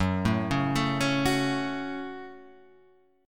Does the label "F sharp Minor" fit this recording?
Yes